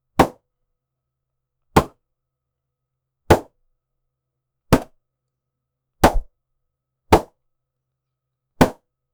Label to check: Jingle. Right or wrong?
Wrong